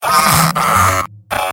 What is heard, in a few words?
pain severe; engineer; tf2; mvm